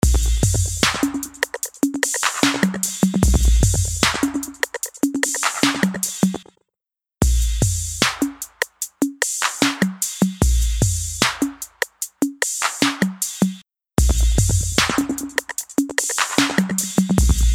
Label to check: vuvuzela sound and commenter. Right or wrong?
Wrong